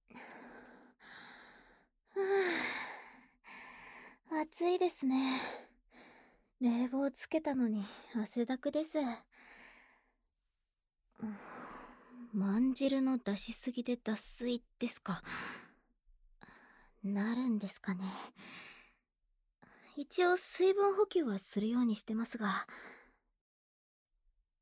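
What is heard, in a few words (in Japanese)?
バイブ音・喘ぎ声・吐息; では、ラストはクリトリスに……【FUKUSA シリコンマッサージャー】; バイブ音(秘部); エロ配信者の江口さん。最新エログッズを、淡々とレビューしていきます。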